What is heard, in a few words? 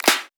Claps